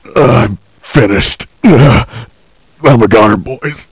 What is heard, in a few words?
Die; USEC